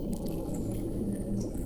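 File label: Music Dpad Evaporate 145; From Dust; PS3; Xbox 360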